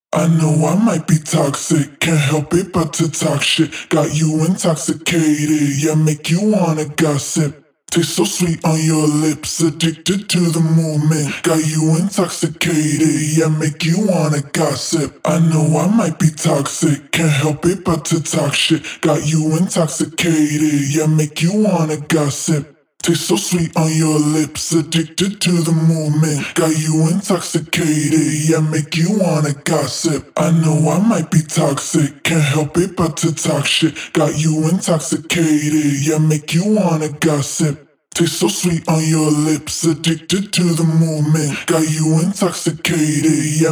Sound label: Acapella Vocal